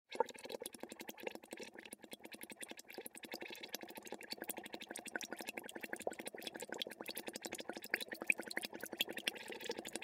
Bubbles Gurgle, Water Bubbles Gurgle Blow Air Through Straw Surface; Gargling; Blastwave